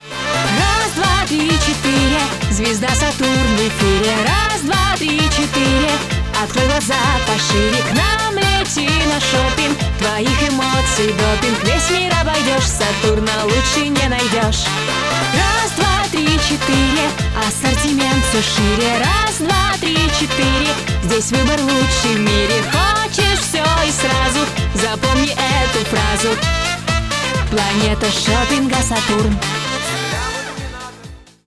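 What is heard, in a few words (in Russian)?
фирменный джингл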